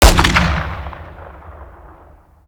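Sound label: weapon br2 fire player atmo ext; gmod; viper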